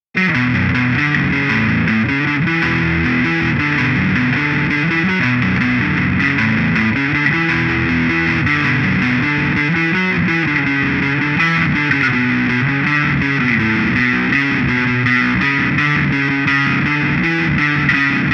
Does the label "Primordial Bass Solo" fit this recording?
Yes